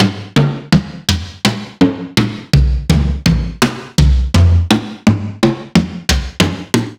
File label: Toms Acoustic Toms; ghosthack